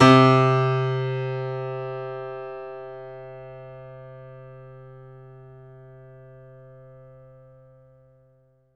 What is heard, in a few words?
piano